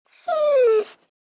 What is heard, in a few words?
dog NG; Inu shizu